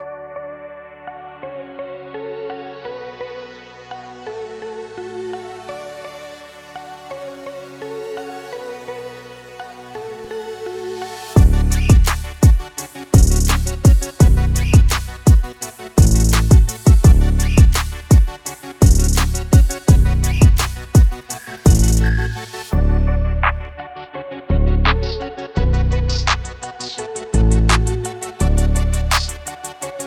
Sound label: Keep It Metal